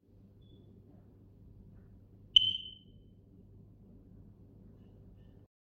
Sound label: low-battery-signal, rauchmelder